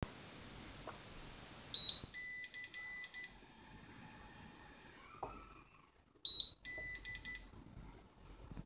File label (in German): motorstart stopp, mikrokopter